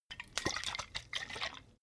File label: bottle vodka shake single; berufe